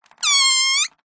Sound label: foley balloon deflate squeezed valve squeak high pitched; zapsplat